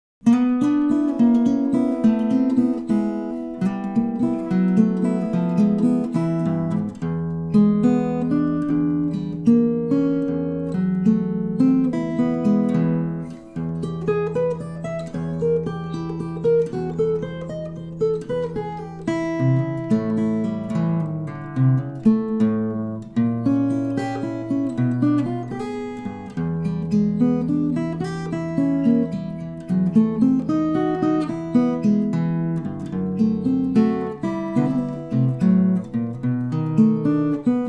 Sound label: blues; Austin